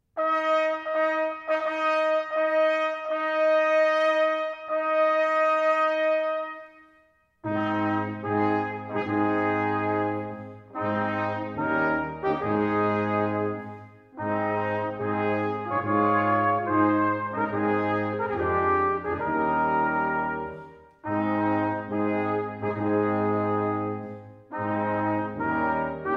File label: Bridal Chorus, brass